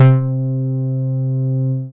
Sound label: BAS MINI, Mini-Moog